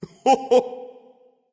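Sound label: "heh heh", nonverbal; smite; voicepack; khepri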